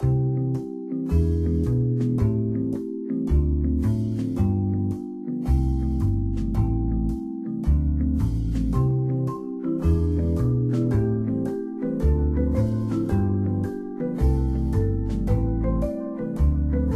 music Room

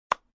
High Five clap; characters

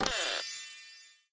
open chest

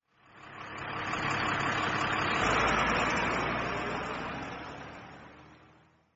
truck

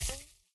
remove web

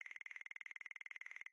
text appear

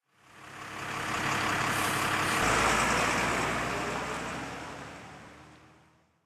truck

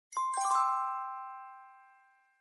cleaning Start

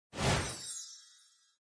place Furniture

